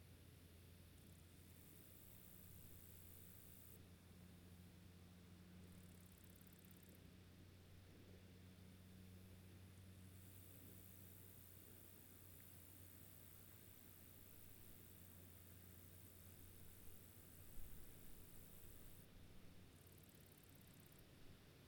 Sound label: ambient grasshoppers near water